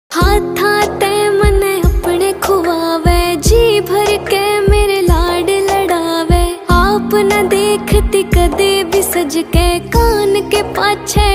haryanvi ringtone

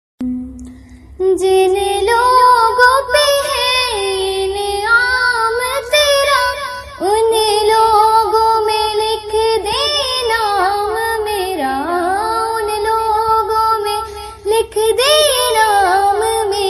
Islamic ringtones

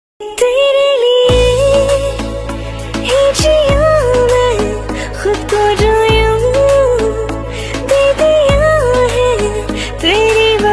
female version hindi ringtone

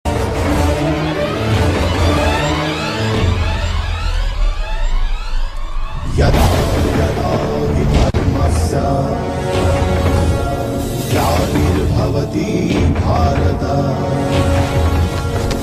Devotional BGM ringtone

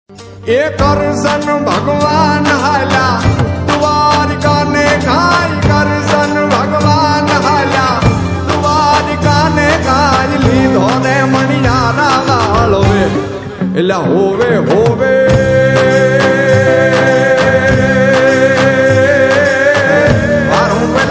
gujarati ringtone

